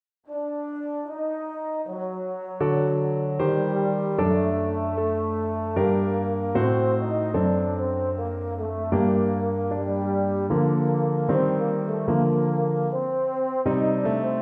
EUPH, euphonium

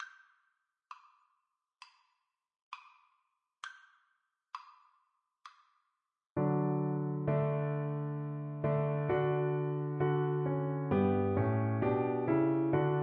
Navy Hymn viola

